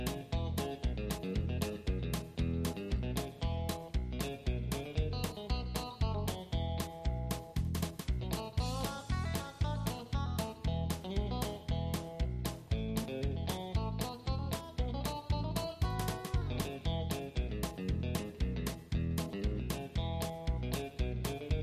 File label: music cheerful; bgm